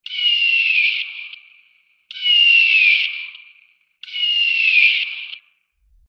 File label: Eagle Screech; birds